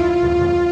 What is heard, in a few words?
music